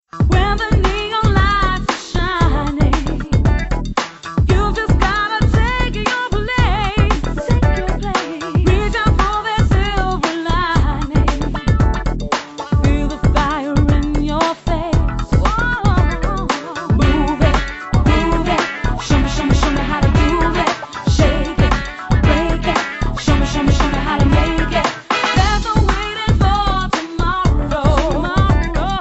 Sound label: Nokia Tune